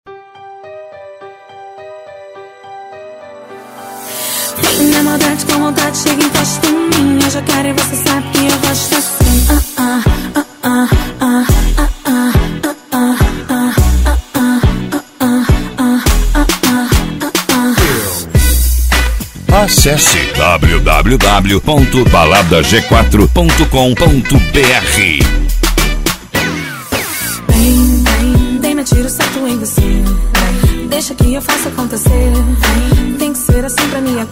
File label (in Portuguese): batida funk